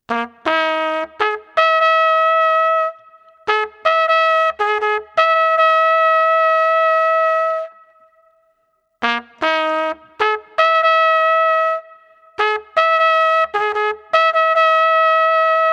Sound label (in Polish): Hejnał; Hejnał Rzeszowa